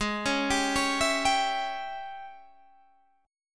Ab Major 7th Suspended 4th Sharp 5th Ab down Guitar Open G